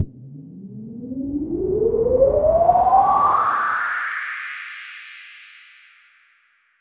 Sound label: a smooth level up sounds